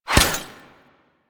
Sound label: Glass Shatter Damage Hit; DE; Warframe